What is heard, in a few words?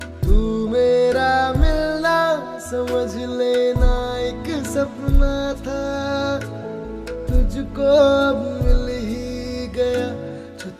Breakup Song Ringtone